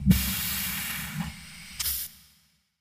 Hydraulic Release, pneumatic

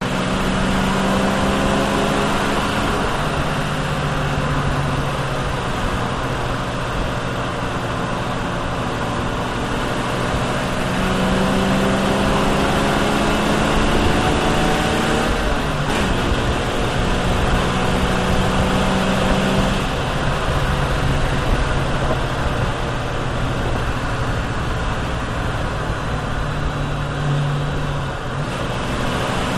Mustang Accel Decel

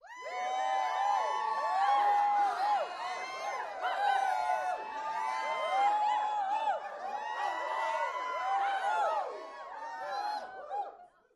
Crowd 20 Rowdy Short, small int

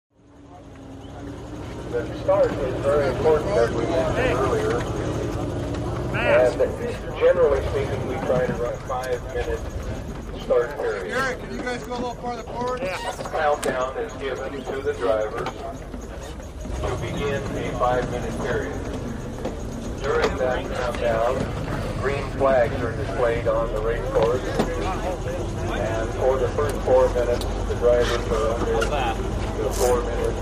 Boat Race Pit Doc Amb, motorboats

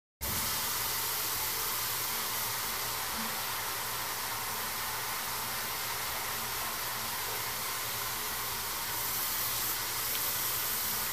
Locker Room Faucet, sink

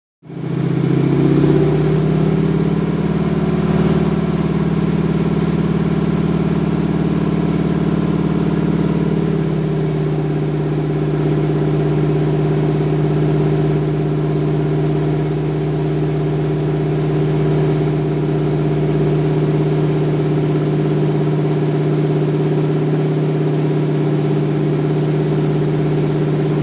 Prop Plane Interior, Interior Harvard

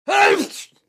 Male Lrg Deep Sneeze

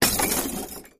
Glass Being Dropped; medium

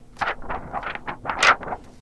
Paper Falls Floor

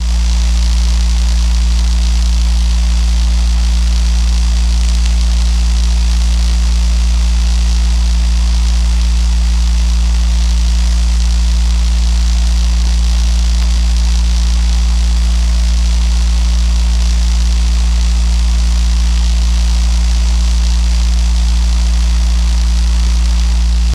Power Plant Elect Li, buzz & hums

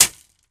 Shovel Stab Gravel

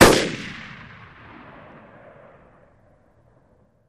Gun AR-15 Single Shot Fire

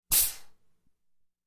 Small Air Brake

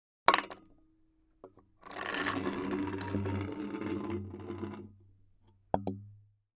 Howitzer Artillery, howitzer cannon

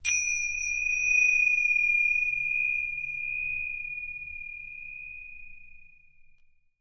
Tibetan Bell Strike, bells

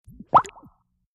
Bubble Pop Bloopy, bubbles

